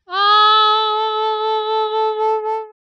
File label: fl sop 22harm